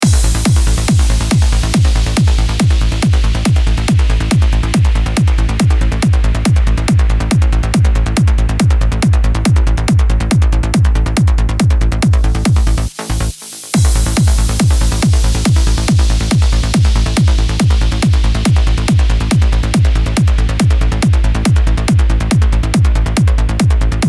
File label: driving trance kick bass; Template Logic Pro X; Allan Morrow